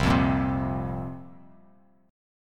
C 7th Suspended 4th, chord; C7sus4 strummed

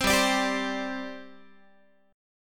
G Suspended 4th G down Guitar; Gsus4 strummed; 15,15,17,17,16,x

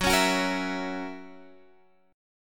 Gb 7th Gb down Guitar Half Step Down, chord

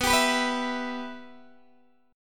B Suspended 4th Sharp 5th, Guitar, Open D

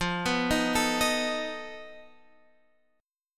F 7th Flat 5th F down

